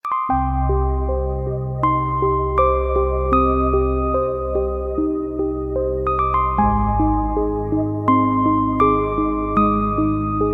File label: dream piano ringtone